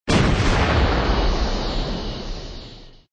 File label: Cannon shot